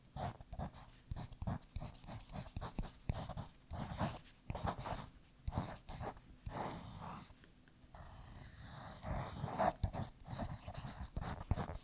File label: Writting On Paper